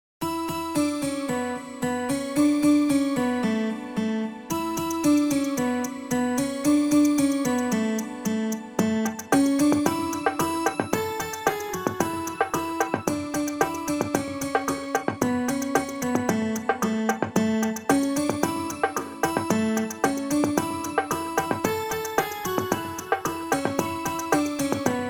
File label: Backing track